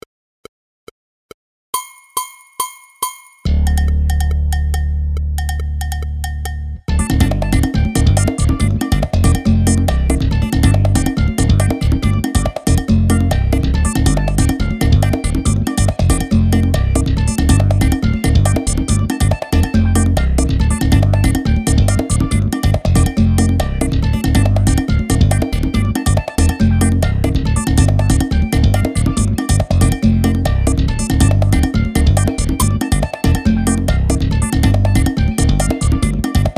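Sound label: afro groove loop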